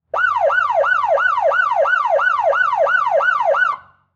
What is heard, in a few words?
Auto Police Car Siren; Transportation